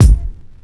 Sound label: small reverb kick drum single hit c key